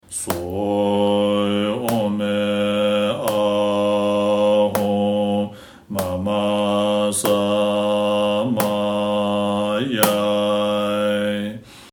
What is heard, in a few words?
mantra